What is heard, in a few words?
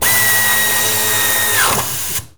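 Air ratchet, air tools, industrial; Air toolks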